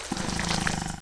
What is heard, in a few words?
shock draw, weapons